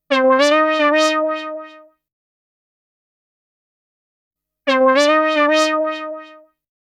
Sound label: 105 Cmaj SUGARFUNK SYNTH; 105 BPM